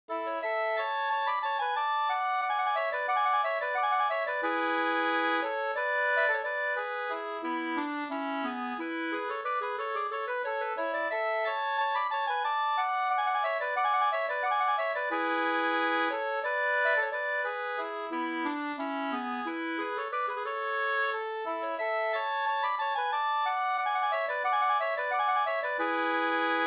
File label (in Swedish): ganglat, 2st; Trettondagsmarschen